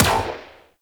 Venus Guard Turret Rifle Fire; Turrets

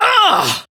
Pain Female

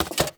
Putdown Tablet; Warframe; Cephalon Weave

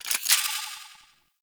Speargun Equip; Warframe; Weapons; Tn Hydroid Speargun

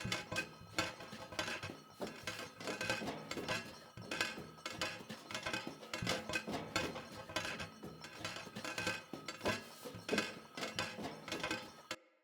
Corrupted Battle Dog Walk Loop Foley, Footsteps; Animals

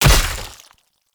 Great Sword Impact Flesh; Weapon Impacts; Founders Great Sword; Combat

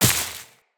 Step Veg, Footsteps, Walk; Tenno

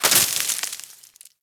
Landscape Plant Destroyed; Warframe; Venus Open World

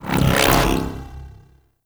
ricochet deactivate; Cowgirl

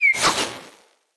Bow Arrow Charged; Weapons; Player; Bows; DendritBow

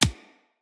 Bullet Impact Hit Notification Two; Weapons

